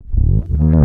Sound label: tape fx, scratch; Ambient Grooves